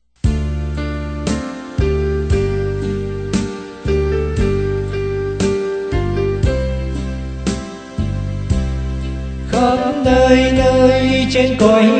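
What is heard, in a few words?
soprano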